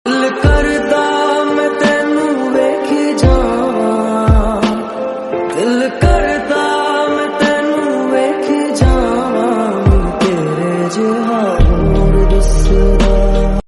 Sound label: lofi